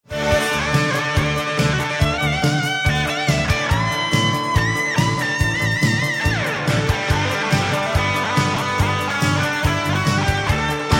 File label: pop